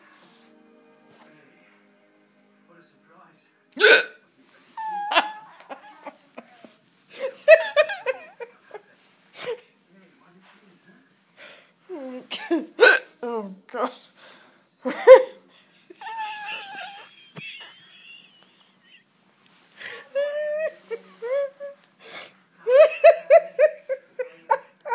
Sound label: hiccups and a fit of giggles